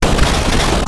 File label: Mechanical Malfunction Rumble